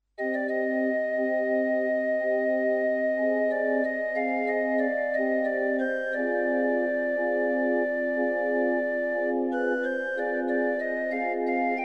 organ pastoral

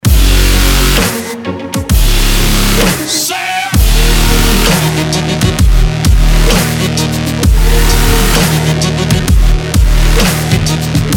sail hard dubstep

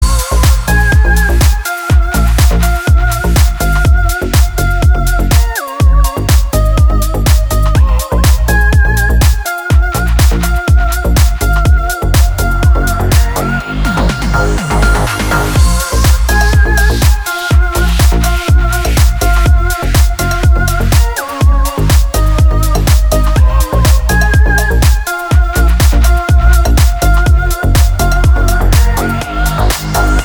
voices dub mix

